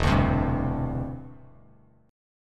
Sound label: E Minor 7th Sharp 5th, down, Bass Standard; strummed